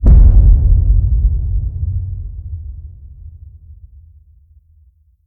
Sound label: HIT Boom, Cinematic Hits Designed